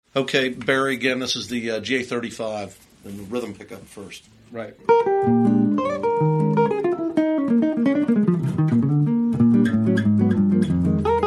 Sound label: All Pickup, guitars